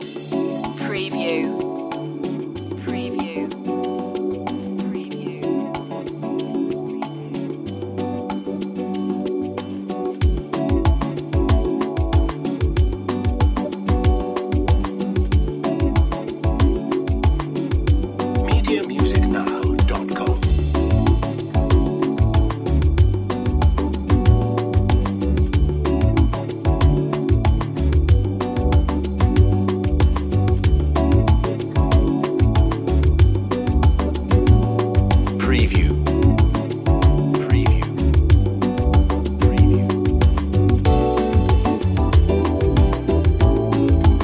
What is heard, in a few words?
smooth bossa nova underscore